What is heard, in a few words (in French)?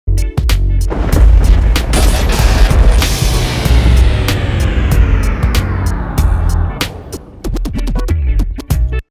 MUSIQUE; PIXELS